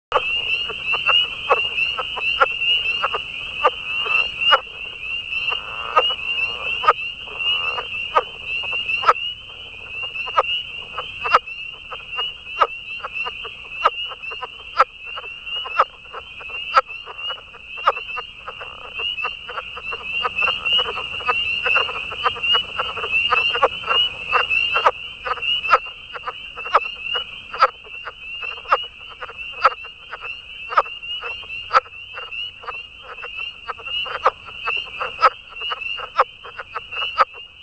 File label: Rana kauffeldi